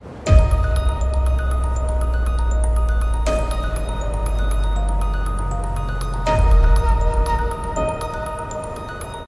fairy trance

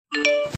notification sound; weplay

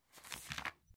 book opening

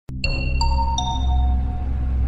intercom

